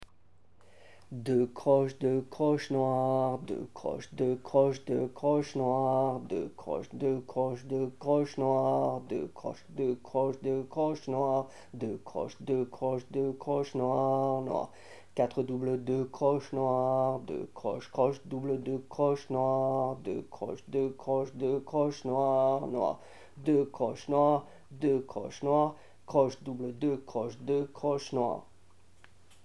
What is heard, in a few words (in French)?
rythme chante